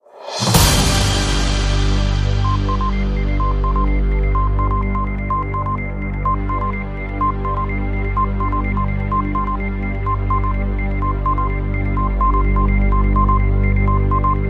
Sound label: Less Busy